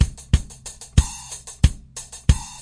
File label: funkisharp no sd, drum loops and breaks, 92 bpm; 091 to 100 bpm